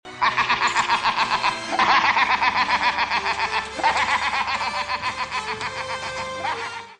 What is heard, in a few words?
Scarecrow Laugh; Halloween